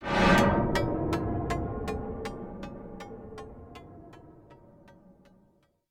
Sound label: AMBNT 160; eJay; Ambient